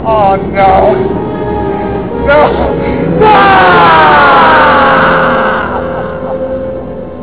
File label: oh no